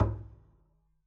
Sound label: pizz noise low thump, double bass